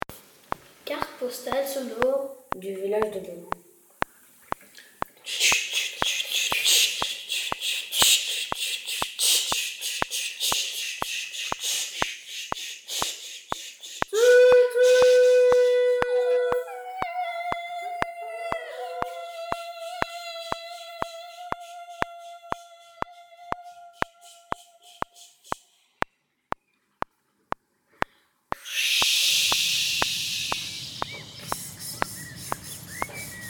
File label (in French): carte postale sonore; bauduen; pnrverdon